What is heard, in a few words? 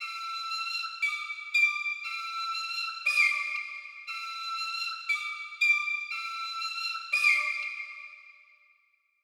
118 BPM D Min Flute; Melody; Loop Stems; Cymatics; 2022 Melody Collection Platinum Expansion; Off White